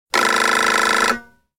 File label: Reed; Nokia N86